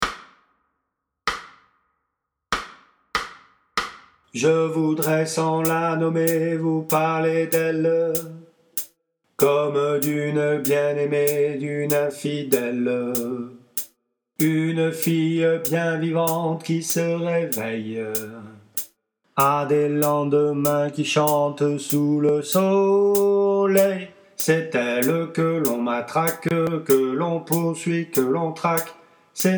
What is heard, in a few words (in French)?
Basses, chorale